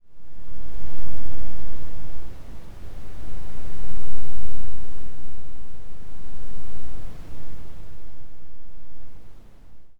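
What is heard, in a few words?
wind, Nature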